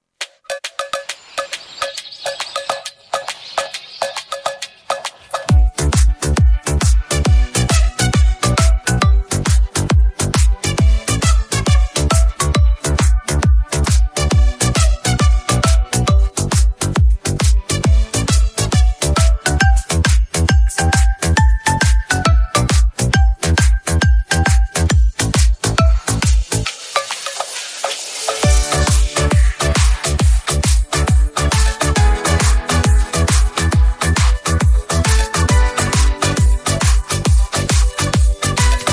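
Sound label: backing track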